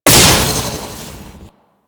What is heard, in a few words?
Archwing Movement Malfunction, Vehicles; Warframe